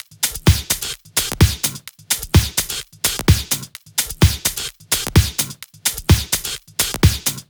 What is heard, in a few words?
128BPM Kit; Vengeance Samples; Timebomb Kit